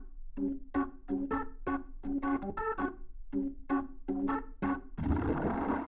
Organ

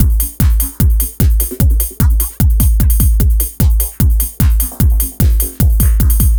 Dance Drumloop; Drums; Dance Beats

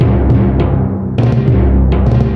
orchestral; Deathchase; Retro

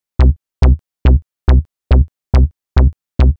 Synth Bass; Basses

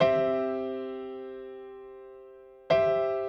Acoustic Piano

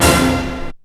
HIT, STAB; SoundFactory; SMPMANIA

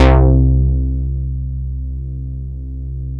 MOOG BASS; SoundFactory

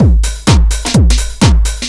LOOP; SoundFactory; DRUMLOOP

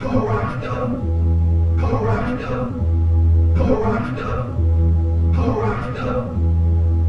Processed Vocals, Loops

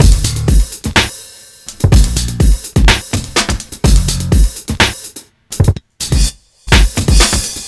Old School Break, Drums

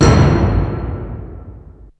drum hit; SoundFactory; SMPMANIA; EFFECTS

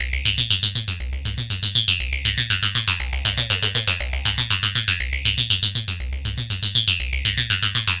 Electronic Percussion

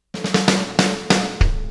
Acoustic Fill, Drums

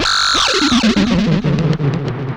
circuit

